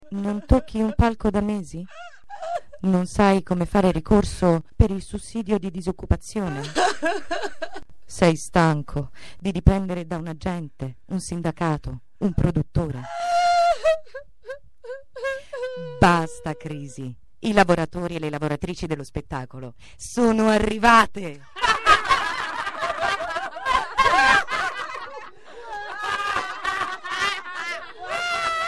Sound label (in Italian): spot pianto